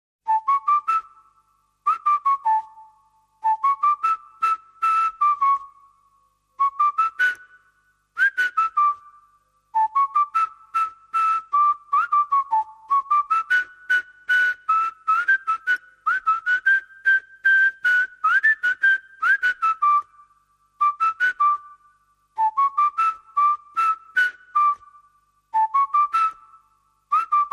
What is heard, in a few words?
Whistle Only